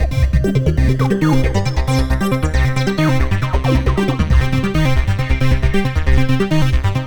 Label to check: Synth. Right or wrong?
Right